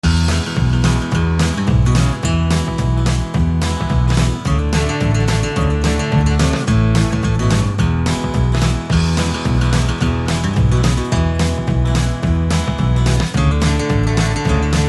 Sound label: country track